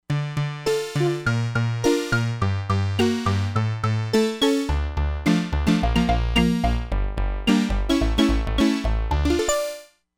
DIGITAL PERCUSSION + CLAVICHORD, Crumar Bit 99; Factory Programs

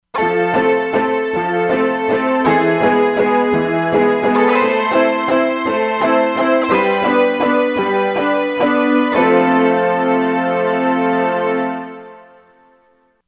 Rubab Str, Korg MSC-13S Ethnic

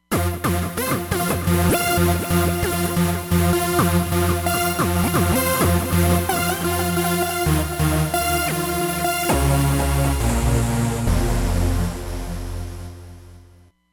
R-Trance, Roland Fantom XR

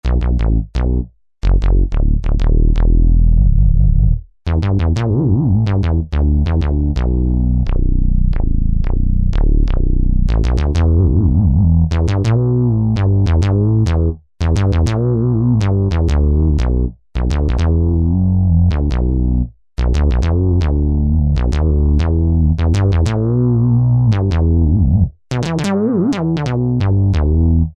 Bass Mondo Bass, synth

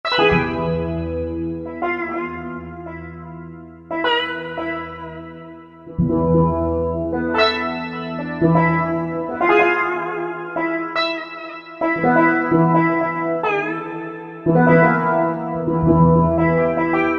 Sitar, Korg M1; Factory preset examples

